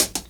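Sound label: double hat, Closed Hats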